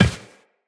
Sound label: Step Glass, Footsteps Walk; Tenno